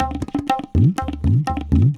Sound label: tabla 120